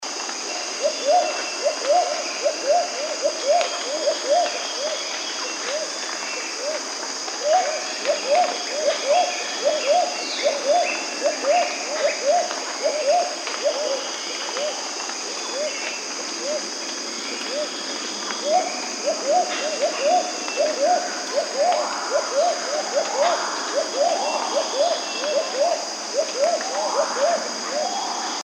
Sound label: great cuckoo dove